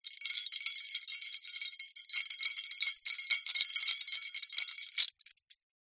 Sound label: foley medals x6 rattle movement